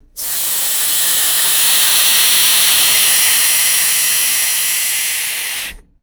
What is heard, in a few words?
steady pneumatic air pressure release hiss